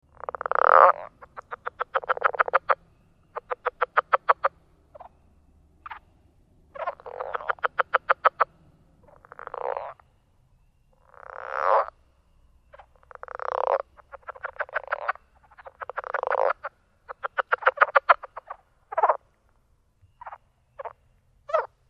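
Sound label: Leopard, frog; herps of NC; anurans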